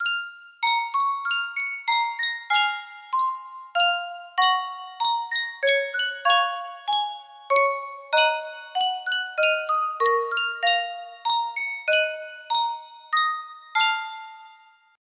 Yunsheng Music Box, music box melody; from up on poppy hill

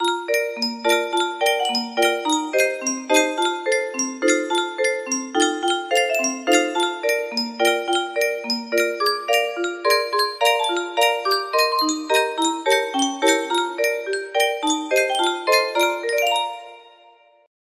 music box melody

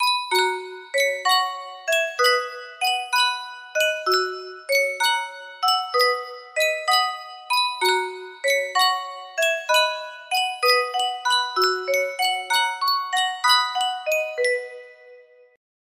sankyo music box, waltz

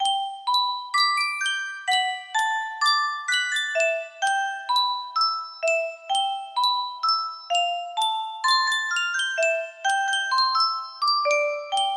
music box, music box melody

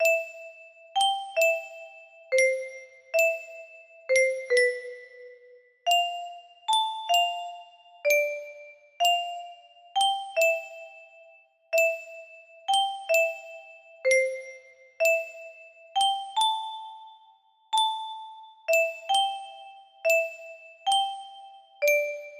music box high

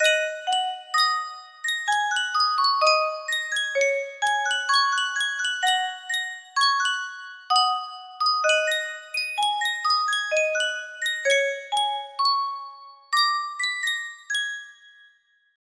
sankyo music box

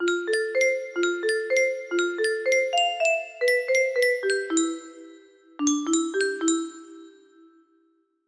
melody, music box melody; loz lost woods

